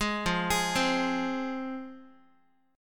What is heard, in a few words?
F Minor, Guitar; arpeggiated; 11,13,11,10,x,x; JGuitar